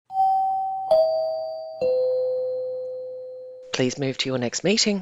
three chimes descending please move to your next meeting